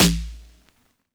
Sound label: snare, 808; GJaxx; heat